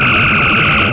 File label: phaser